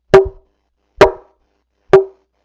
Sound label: Modern Granada Resonator